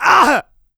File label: pain large, player; big